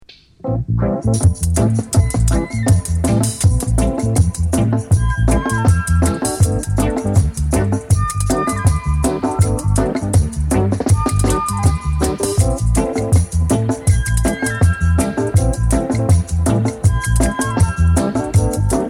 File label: Oboe; Soul Jazz Records; Studio One